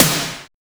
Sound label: stack snare; Drums In Your FACE!